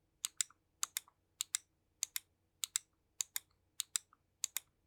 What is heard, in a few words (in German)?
Maustaten; Aerox3